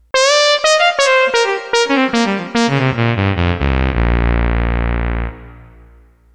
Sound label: Brass